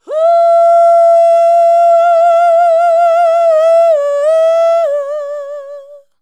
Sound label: UUUH, SHOUTS; Best Service; Hyper Dance